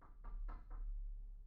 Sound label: knock; IEEE AASP